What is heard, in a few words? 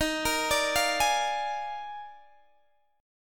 Eb Major 7th Suspended 2nd Suspended 4th, Guitar Open D; down